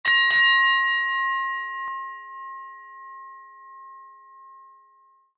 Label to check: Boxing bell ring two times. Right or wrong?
Right